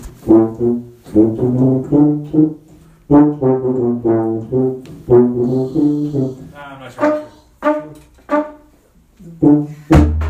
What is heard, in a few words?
Low brass grooves; workshop